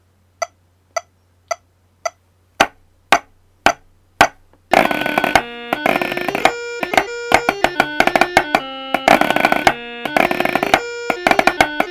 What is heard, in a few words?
Highland Fling Snare Chanter